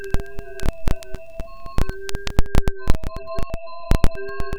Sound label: loop, techno atmos